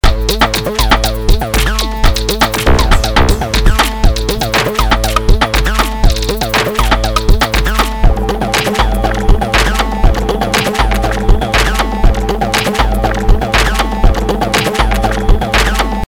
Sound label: Unmix Drums, E-Drums; mit dem Bass-Synthesizer der Fine Tune Page der Kickdrum noch mehr Wumms; Zynaptiq